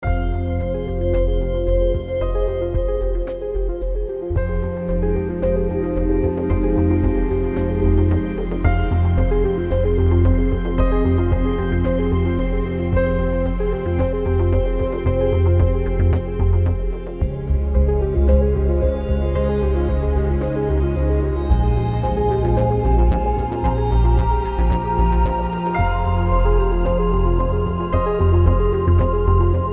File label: Loop